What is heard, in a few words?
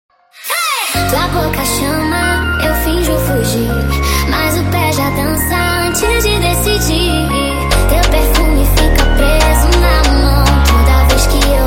sped Up, funk